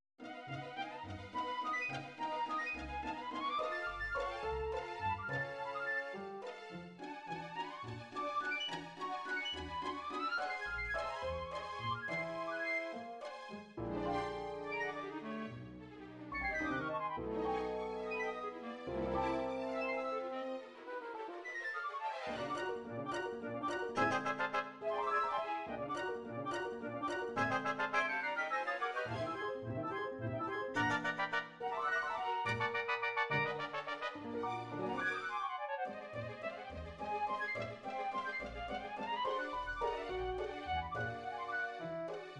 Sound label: flute; stravinski; firebird